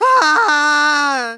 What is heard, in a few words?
hit; NWNDS